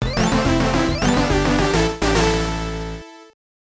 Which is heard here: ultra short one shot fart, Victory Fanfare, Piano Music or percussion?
Victory Fanfare